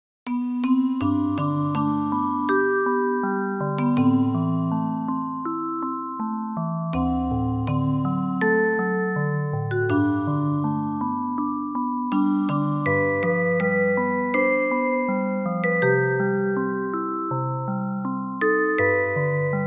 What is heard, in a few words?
chime